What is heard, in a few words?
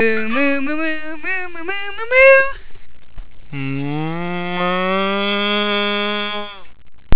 moo